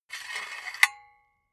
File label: silicone gun empty pusher pull out slow